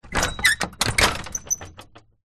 household gate driveway wooden open; zapsplat

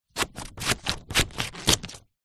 office scissors cutting paper; zapsplat

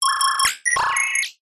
science fiction robot chirp beep metallic short

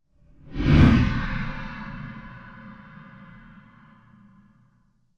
Whoosh Vocal; bottlerocket

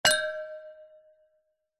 impacts glass hit ping clink reverb; zapsplat

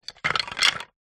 leisure toy train wooden track pieces movement

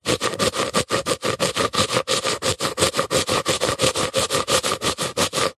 metal pipe cleaning with tissue; zapsplat

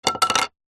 wood stick hollow bamboo drop wood surface; foley; zapsplat

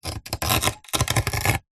wood thin scratch with sharp metal fork

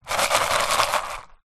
food dried chilies shake in plastic pot; zapsplat

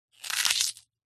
animals insect cockroach crush squash crunch

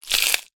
foley footstep broken glass single; zapsplat

